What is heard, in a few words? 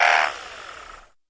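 Motor Small Low Speed, variable speed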